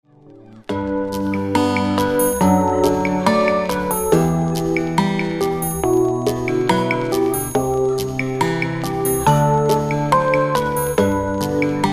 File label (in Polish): muzyka bez oplat